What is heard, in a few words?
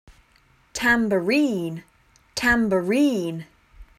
Tambourine